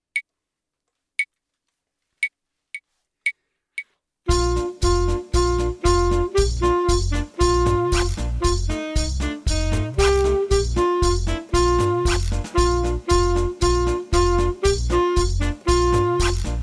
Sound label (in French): le dragon ivre sax